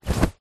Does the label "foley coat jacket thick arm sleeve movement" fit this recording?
Yes